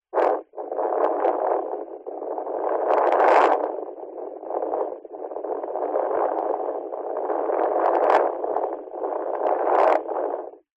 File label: technology cb radio burst wind noise